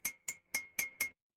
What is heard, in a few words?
glass clink